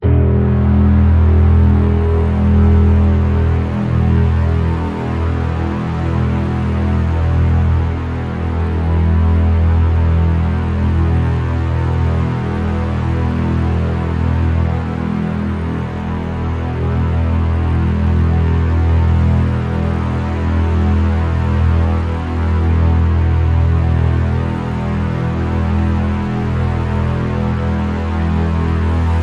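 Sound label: drone nice buzz E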